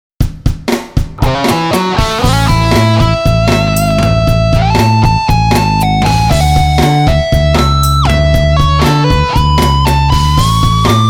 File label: patch demo